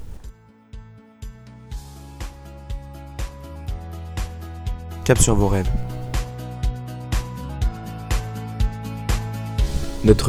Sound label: Jingle